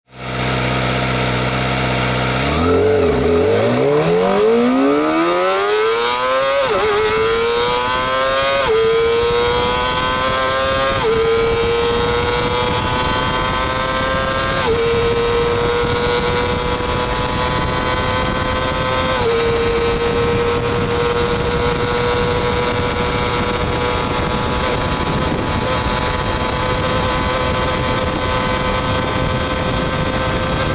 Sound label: zx25r acceleration; zx250e